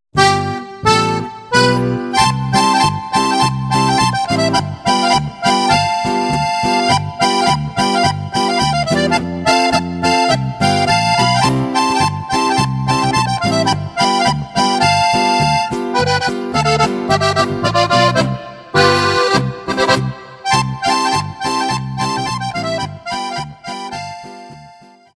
polka

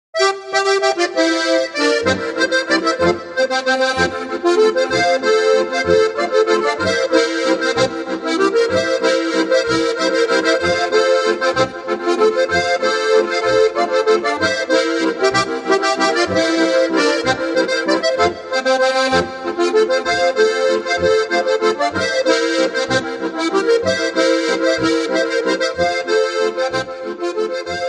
walzer

